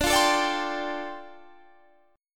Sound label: D Minor 11th D down Guitar Standard; Dm11 strummed; JGuitar